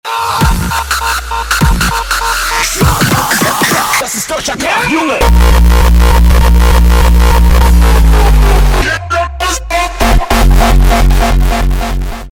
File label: jagermeister remix